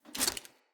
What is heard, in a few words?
Battle Dog Foley Land, Animals; Snc Battle Dog